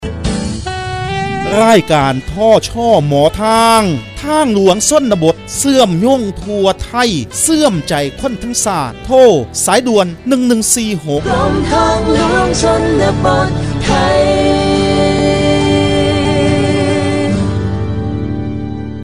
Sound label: Jingle; ภาคอิสาน